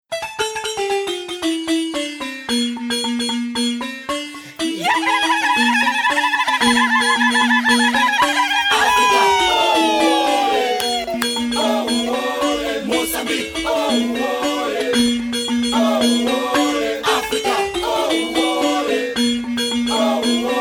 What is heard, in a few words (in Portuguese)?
Timbila vozes; jogos africanos